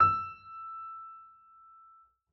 piano e; Golden Crayon Sounds

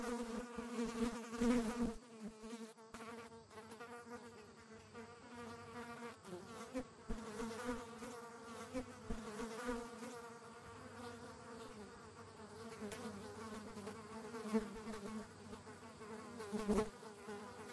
flies; Golden Crayon Sounds

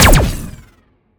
police car weapon fire ext; Original TK Sounds

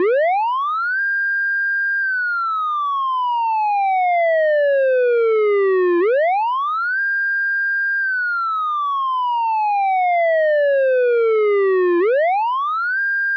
police car siren dist; Original TK Sounds

